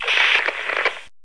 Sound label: ui comm Popup